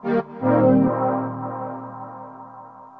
SYNTH PAD AMBNT 160 C; Ambient; Sphere

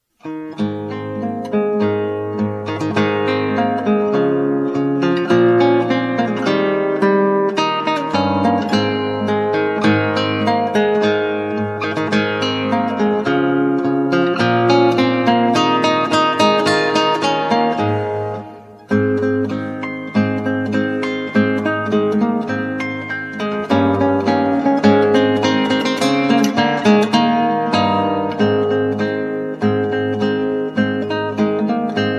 Jingle Bells, Classical Guitar

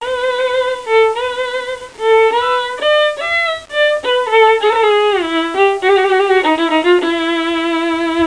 World Lick, Loop Samples; Sonic Foundry

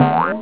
POGO; LiveWire

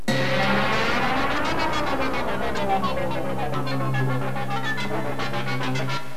eff metal c3; fx; Terra Sound Library; construction set

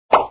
pop

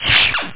computer

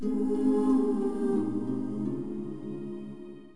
close; Infobase setup disc

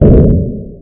boom; Xconq 7.0.1

